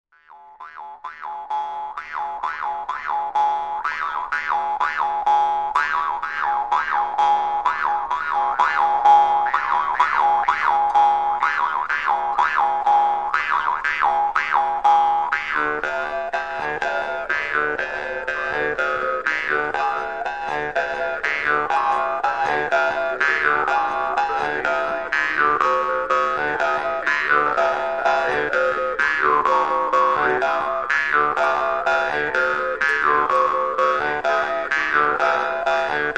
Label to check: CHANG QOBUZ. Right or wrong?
Right